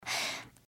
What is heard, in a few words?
breath; pony; Twilight Sparkle